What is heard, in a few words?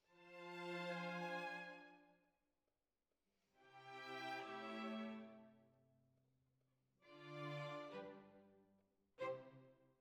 Violins Over